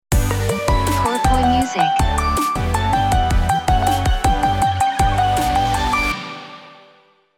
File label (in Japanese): jingle ウォーター